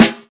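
fluffy snare single hit A key, snare tuned to the A note; snare-drum-samples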